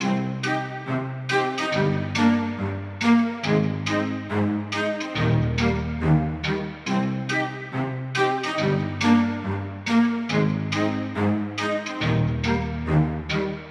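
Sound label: Melody Loop 140 BPM D Major; Cymatics; Cobra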